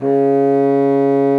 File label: C#3, bassoon; Xsample VOL-6